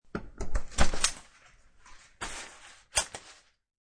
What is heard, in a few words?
Umbrella closes; Umbrellas; Objects